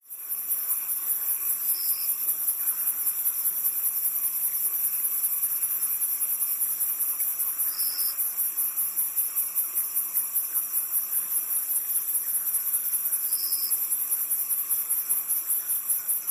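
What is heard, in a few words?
Crickets Insect Swamp Ambience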